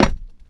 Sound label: metal solid, footsteps; player